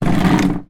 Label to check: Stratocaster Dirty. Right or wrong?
Wrong